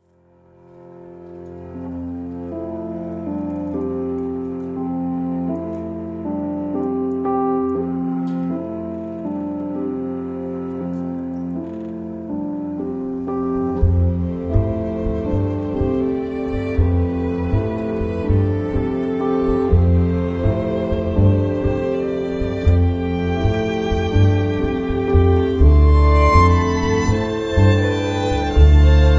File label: Jazz